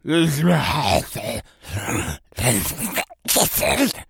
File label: mumbling, infected; npc; idle; szf